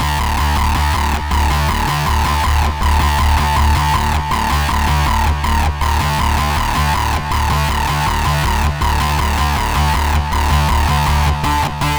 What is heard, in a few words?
arp loop d 160 bpm